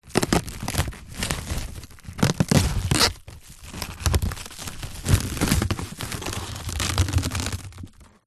household cling film wrap loose handle close up

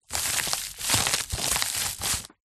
industrial sandpaper sheet movement

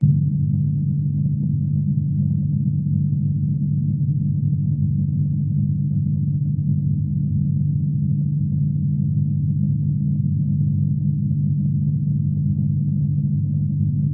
Sci Fi Atmosphere; gain walkers

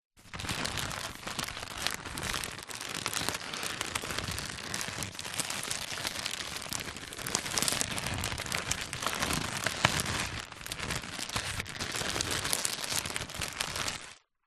foley present gift wrapped handling; zapsplat

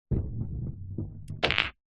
snooker ball roll snooker table into pocket; sport

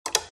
open switch light; fox audio

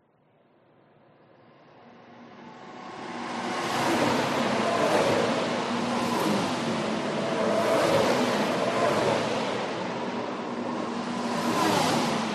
f1 race track pass speed

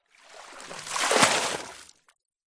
nature water pour medium amount deep sudden fast

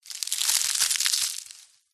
foliage dry crush squeeze crunchy crispy

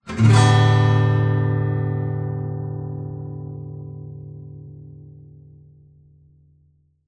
musical guitar steel strings strum out of tune

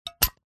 office stapler mechanism close push down

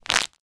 foley packet plastic small grasp squeeze; zapsplat

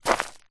foley footstep single stoney path; zapsplat

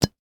food worcester sauce bottle lid open; sound effects one

